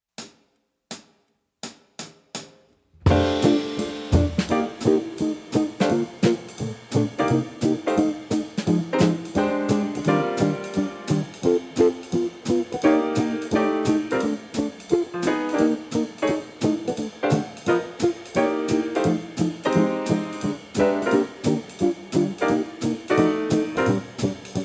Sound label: Rhythm Changes no bass; 2024-2025 All-State High School Jazz Band Etudes